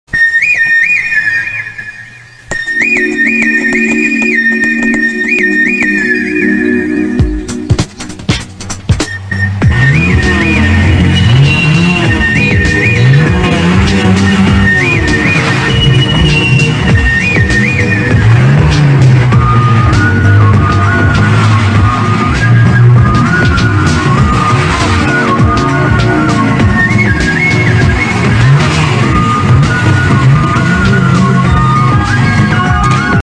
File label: bike bgm; polladhavan